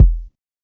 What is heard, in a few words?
Kicks